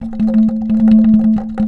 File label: A2 ANKLUNG, JAVA ANKLUNG; WorldSounds